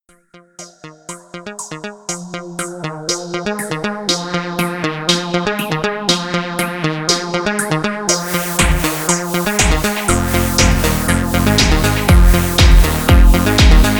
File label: electro forever